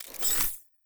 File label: Backpack Open; Custom UI Sounds; Call of Duty Advanced Warfare